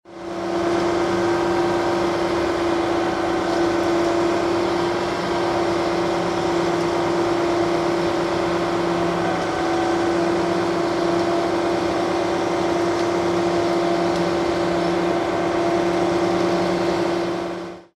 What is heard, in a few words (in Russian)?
zvuki betonomeshalki